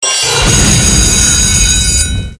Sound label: fullscreen win; games; Arising Phoenix